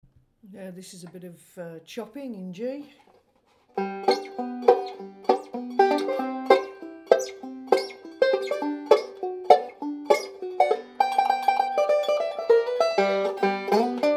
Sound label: chopping, banjo; walnut deluxe; hopkins